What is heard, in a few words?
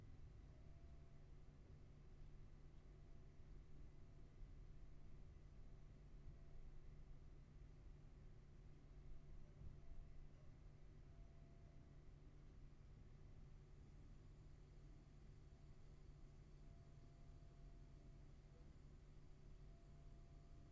room tone